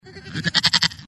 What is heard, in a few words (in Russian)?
zvuk kozla